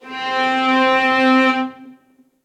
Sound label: Long Sul Pont - String Quartet; Instruments; Individual; String Quartet by Spitfire Audio